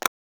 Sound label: click; 3DO; Orbit